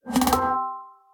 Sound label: message